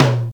high floor tome drum b key, tom sound tuned to the B note